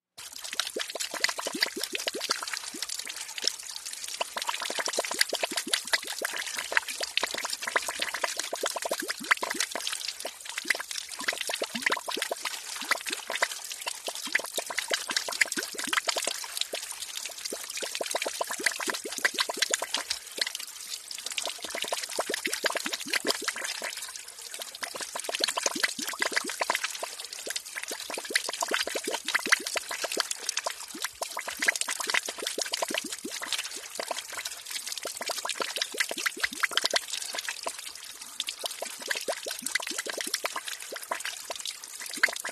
WATER - SPLASHES & SPRAYS HOSE: INT: Hose blurping and blooping, more splashing.